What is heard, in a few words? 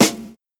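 sizzle snare sound a key, tuned to the A note; snare drum samples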